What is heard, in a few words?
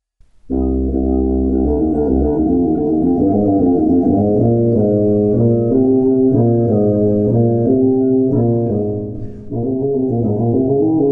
exercise, contrabassoon